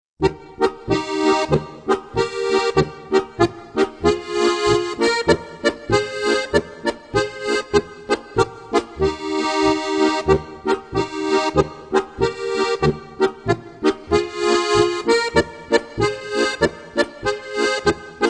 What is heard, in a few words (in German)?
polka; 30 Volkstänze aus dem Alpenland